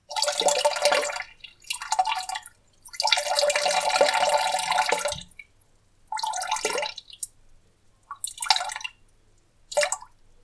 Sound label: Short Urination